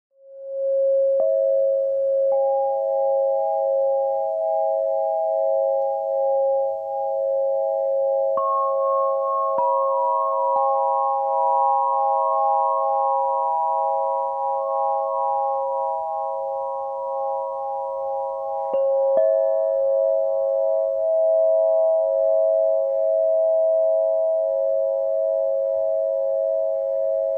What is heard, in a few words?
Stardust Cis, harpe de cristal; harpe de cristal stardust do 8 tubes